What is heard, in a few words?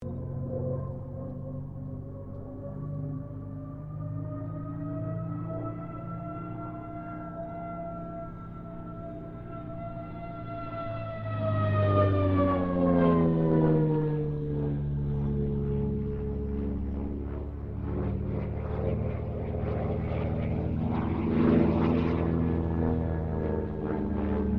AIRCRAFT CA-13 BOOMERANG LOOP STEREO; Airplanes (Sound Effects:Vehicles)